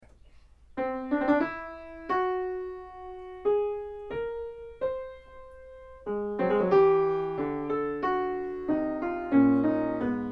Polyphonic Studies, piano

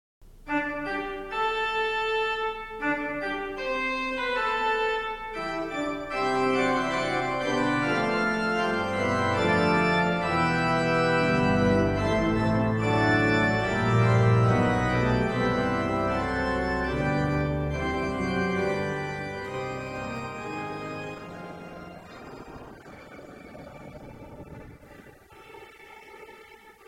Fanfare, organ

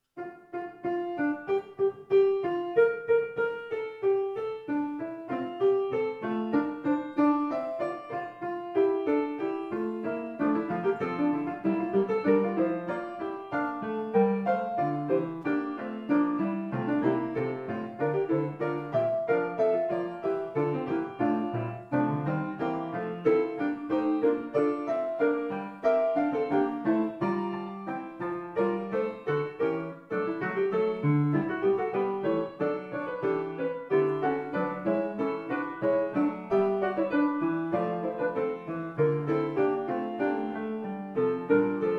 Little Fugue F, piano

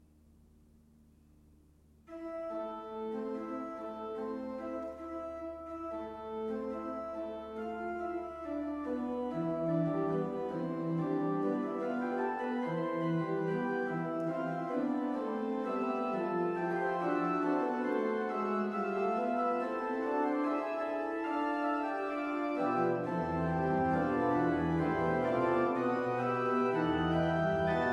Fugue, organ; Margaret Martin

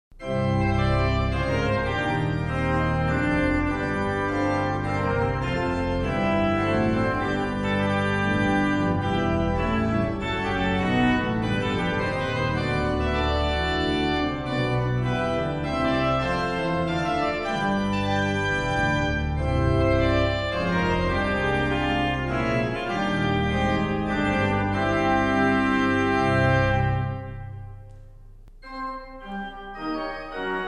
Fanfare, organ

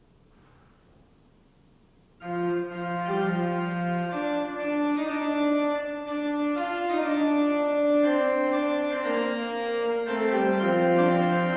Postlude Italian National Anthem, organ; postludes

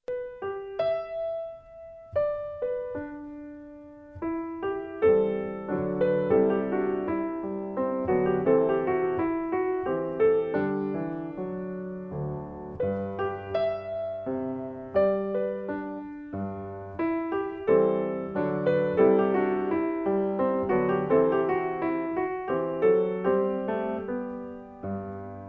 Happy Melody, piano; romantic

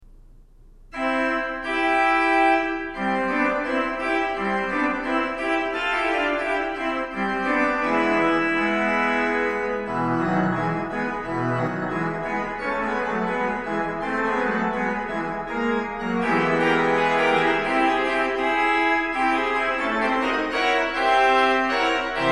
Fanfare, organ

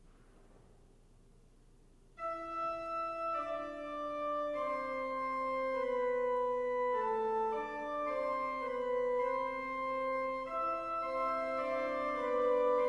Interlude Danish Amen, organ

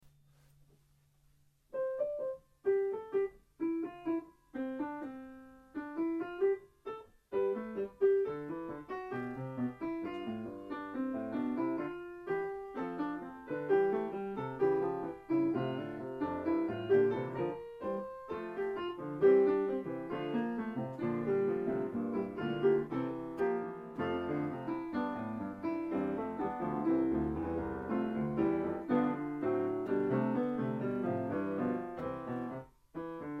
Dippy Egg Fugue, piano; Three Funny Fugues

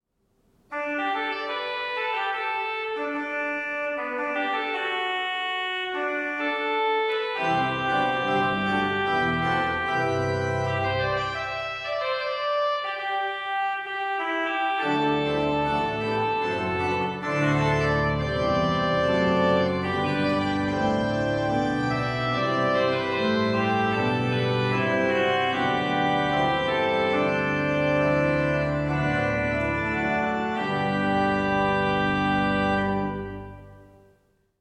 Fanfare, organ

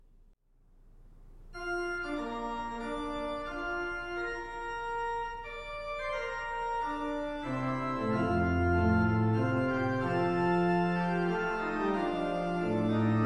Short Pre Postlude The National Anthem, organ; postludes

